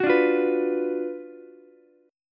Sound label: F sharp Major 7th Suspended 4th Sharp 5th, F sharp, down, Ukulele; F#M7sus4#5 strummed